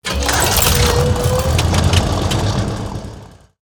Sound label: Junk Racer Underlay Play